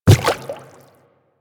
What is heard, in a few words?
Quadruped Medium Walk Water, Footsteps